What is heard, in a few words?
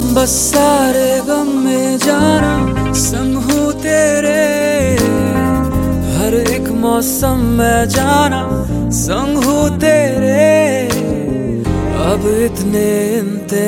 bansuri